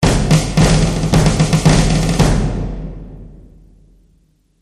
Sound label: Military Roll